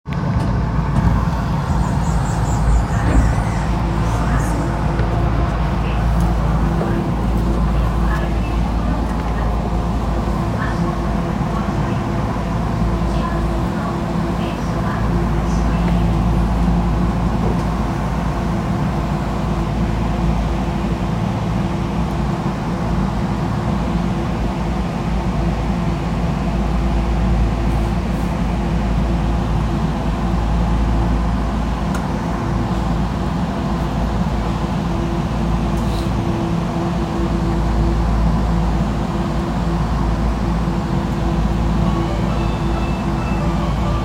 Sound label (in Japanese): 銀座線虎ノ門西, train sounds